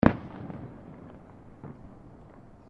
Fireworks Multiple Firecrackers

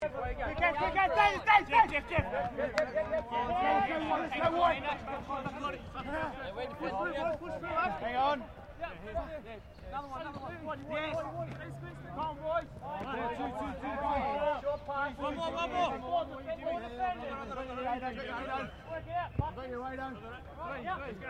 RUGBY LEAGUE TEAM TRAINING; Various rugby sounds; Miscellaneous Sports (Sound Effects:Sports)

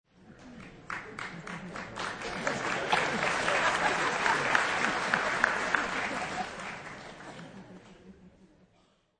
Concert

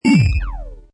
Hi Tech Panel Servo Button

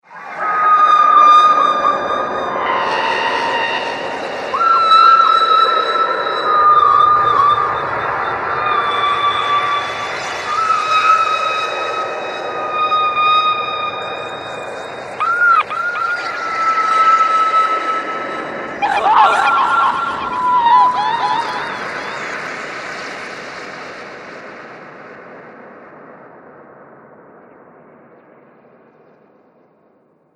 Screams Horror Atmosphere, scary soundscape

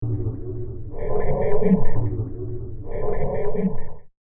Martian Breathing, alien sound effect; science fiction sound effect

